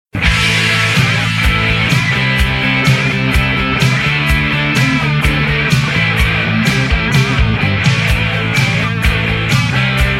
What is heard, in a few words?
mixolydien 2+